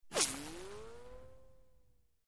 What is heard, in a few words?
Right To Left Tonal, bullet bys